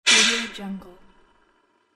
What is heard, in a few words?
short steam release